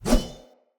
Short Sword Whoosh; Weapons; Swords; Sollos

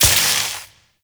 Vial Ability Shock Shatter; Warframe; Warframes; Alchemist; Elements

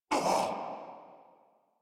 Pain Corrupted Grineer Male

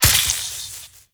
Vial Ability Poison Shatter; Warframe; Warframes; Alchemist; Elements

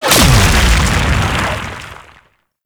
Sentient Vessel Laser Explosion; Warframe; Tau; Abilities

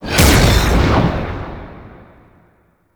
Ship Void Portal Start A; Gameplay; Ambience; Warframe; Corpus Ship Remaster

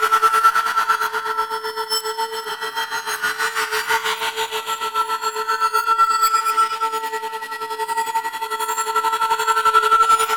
Glass Fragmented Loop; Warframe; Warframes

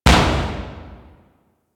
Destruction Short Metal Hits; Ambience; Warframe; Corpus Railjack

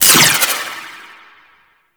Prism Laser Hit; Warframe; Harlequin

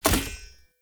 Short Sword Throw Medium Impact Wood Hollow Large; Player

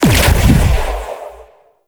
Shield Bubble Activate; Enemies; Abilities; Ethereal; Beacon; Warframe; Zariman; Void Angel

